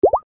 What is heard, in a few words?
bubbles